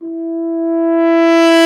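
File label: brass, F.Horn Swell; Roland L-CD702; F.Horn FX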